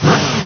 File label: sfx chat close